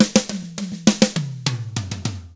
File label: ROCK, Drums; ILIO; Double Platinum Drums 1